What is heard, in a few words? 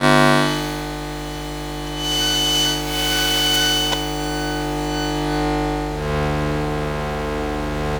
Long Buzzing Power Glitch